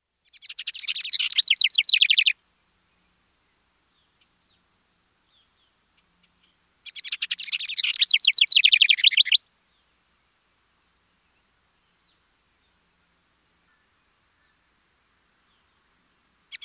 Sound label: house wren